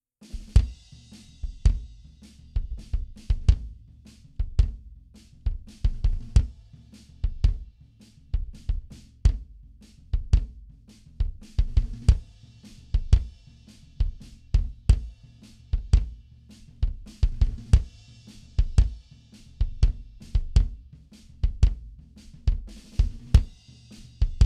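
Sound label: S602 BD2 mono Drums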